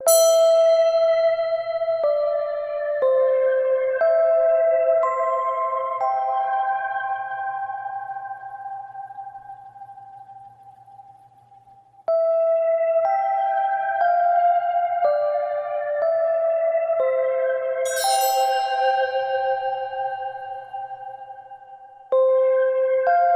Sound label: Chimes Loop; Bathroom